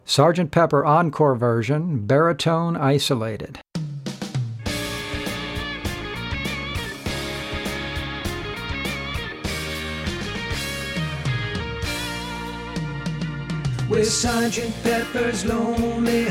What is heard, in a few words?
BARI ISOLATED; practice tracks for singers